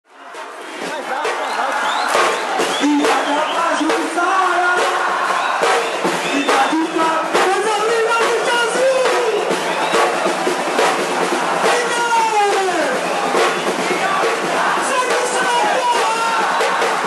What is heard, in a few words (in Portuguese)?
bateria